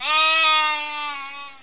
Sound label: sheep fly; Hexen 2